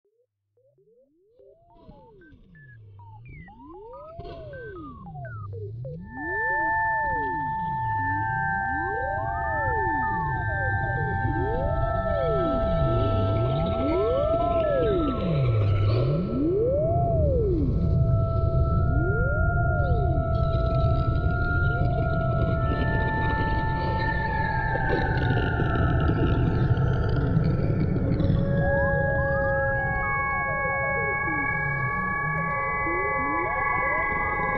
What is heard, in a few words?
Creepy Music; yiik i.v. nameless psychosis windows gamerip 2024